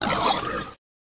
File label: Attack